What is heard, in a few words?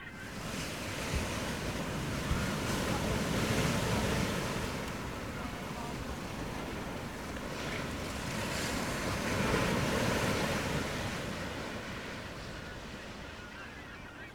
ocean